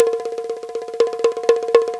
Paigu 120; Sonic Foundry; Hip-Hop Street Beats Construction Kit; Opium

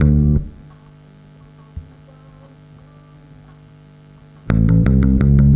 BASS, BASS GTR

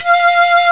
fife, British fife; Roland L-CDX-03 Disk 1

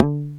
DRUMS, Didgeridoo; Big Fish Audio - Didgeridoo and Other Primitive Instruments; DOODRUMS

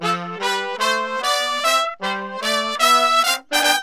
BRASS SAMBA; Best Service ProSamples vol.14; AKAI

